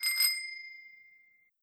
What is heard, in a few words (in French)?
dring dring